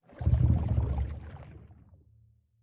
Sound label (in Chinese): 气泡柱漩涡环境声; ambient